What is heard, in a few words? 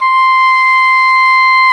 oboe, Oboe Brth